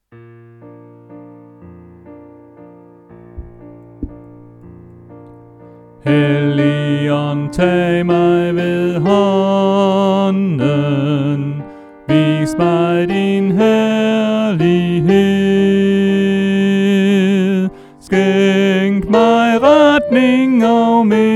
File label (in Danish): Sopran